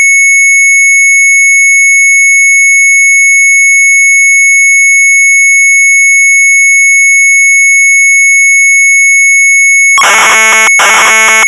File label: MZ800 Tape, sharp; COMPETITIE; retromelsarchive